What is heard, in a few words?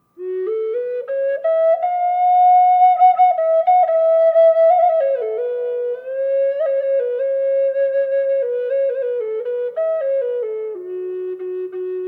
honduran reverb rosewood burl, flutes